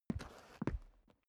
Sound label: step boots concrete scuff; menschen; schritte